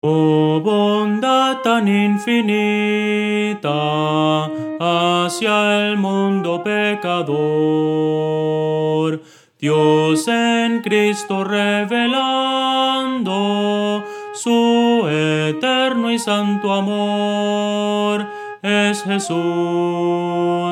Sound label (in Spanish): soprano; Voces para coro